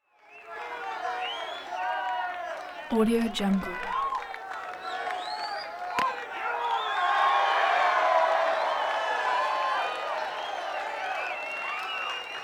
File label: baseball crowd constant; prosoundfx